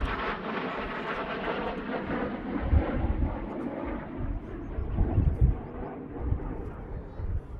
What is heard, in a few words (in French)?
Inde avion de chasse